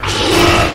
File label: stun; slender